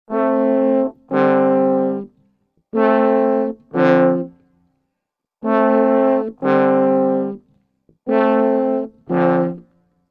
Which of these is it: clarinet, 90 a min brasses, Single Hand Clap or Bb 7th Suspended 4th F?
90 a min brasses